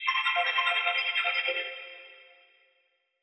glitch F maj; fx; bespoke - Floral v.1

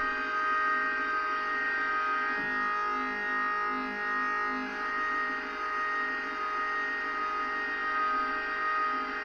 pad bad rec Ab; base pack

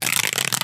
Iguana scales, Foley; SFX; 7rystan's 600+ FREE EDM SAMPLES & DRUMS

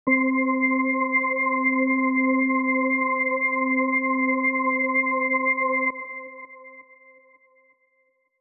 organ; bespoke - Floral v.1

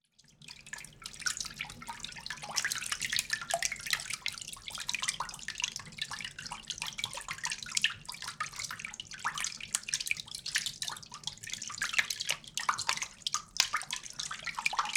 foley; bespoke - Floral v.1

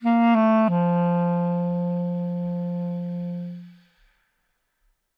clarinet phrase Bbmaj 100bpm; bespoke - Floral v.2

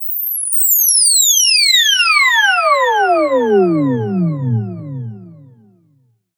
Sweep; 7rystan's 600+ FREE EDM SAMPLES & DRUMS; SFX

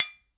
percussion; bespoke - Floral v.1

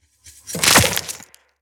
Snare

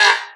Metal beep; SFX; One shot; 7rystan's 600+ FREE EDM SAMPLES & DRUMS

